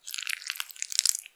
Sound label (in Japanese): 挿入or引き抜かれ終わる直前 普通; 挿入or引き抜く